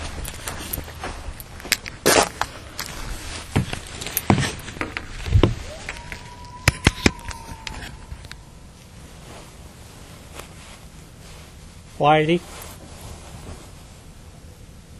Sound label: howl